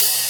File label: Open Hihats, Cymbals; Vengeance Samples